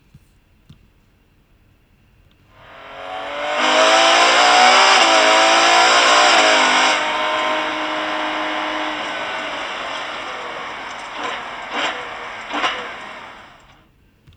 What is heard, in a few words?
812 superfast shifting onboard, Ferrari